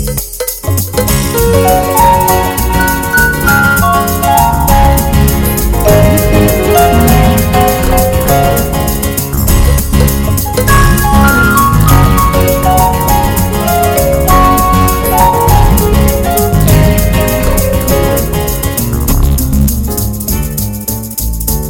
A Fast BeBop

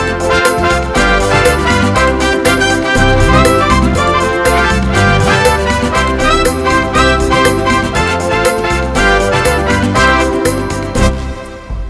Hot Tropicana Dance

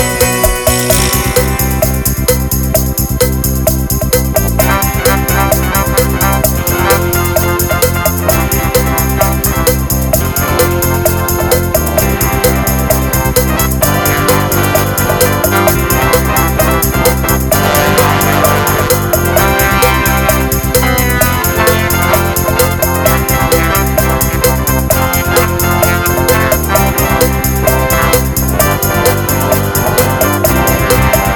Happy Music

